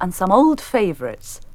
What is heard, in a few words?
VOX GENERAL; AMG Megabass REMIX! VOL-2